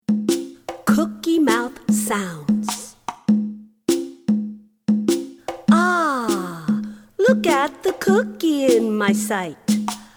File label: Cookie Mouth Sounds